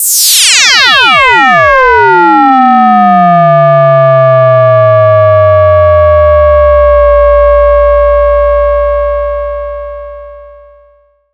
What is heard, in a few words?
SYNTH FX